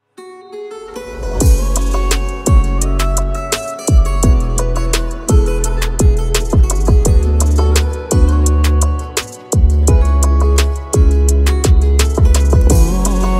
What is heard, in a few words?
instrumental